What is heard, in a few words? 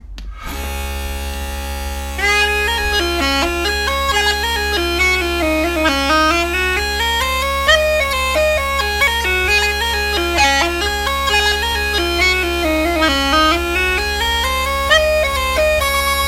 Polka, irish sean nos dance; advanced